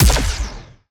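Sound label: Laser gun Fire; Hyperion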